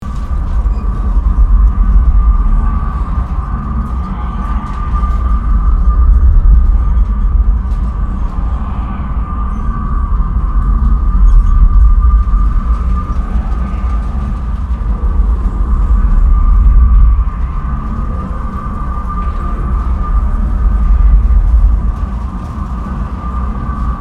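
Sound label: Ambience City Office Transition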